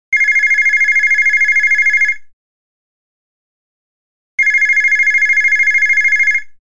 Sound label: Electric Phone, Ringtones; DM-L200; LG